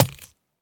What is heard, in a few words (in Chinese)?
三叉戟穿刺声